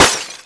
bullet vs vetro

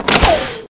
porta scorrevole

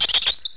topo, animali

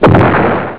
mortaio

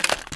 ricarica, armi

